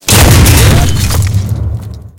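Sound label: tank hit high-explosive small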